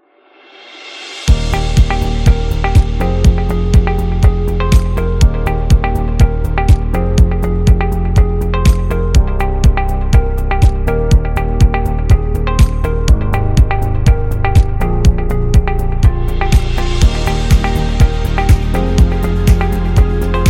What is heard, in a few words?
Uplifting Corporate